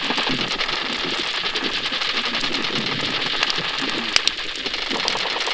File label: bairdiella aggregation